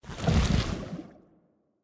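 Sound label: movement armor; smite; cthulhu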